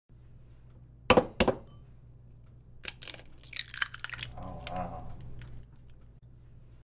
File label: break the egg; شکستن تخم مرغ